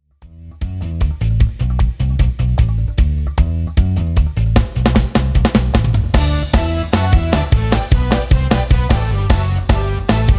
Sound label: rhythm